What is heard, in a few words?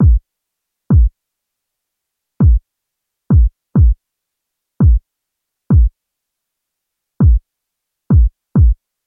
calidrums